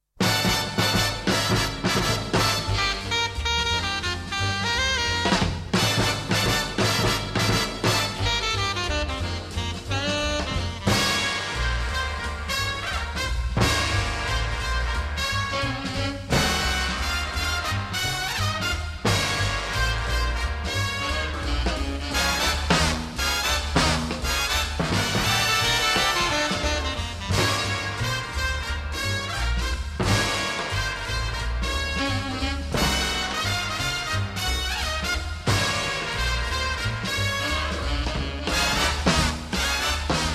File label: Orchestra